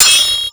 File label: SW HIT, MORESWORD; Total Control - Action Cutz